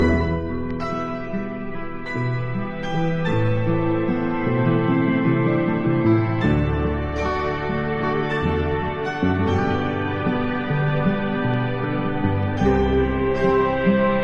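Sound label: guitar and keys and strings, Sad; Loops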